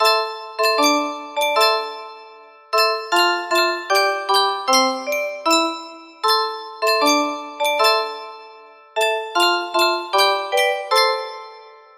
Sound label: music box melody